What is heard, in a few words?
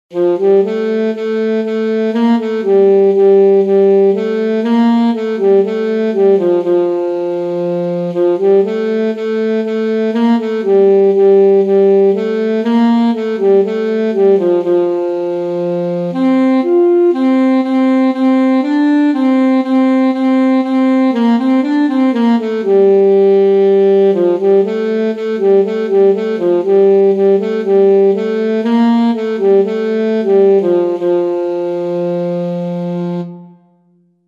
alto saxophone; peasant cantata